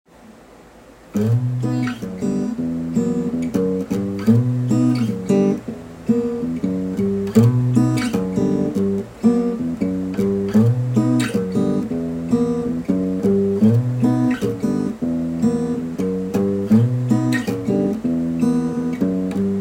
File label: Suspicious Riff